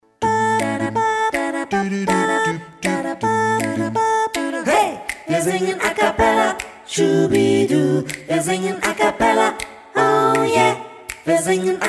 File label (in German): Acappella - Chor